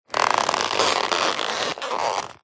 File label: foley cardboard condensed thick squeeze crush squeak; zapsplat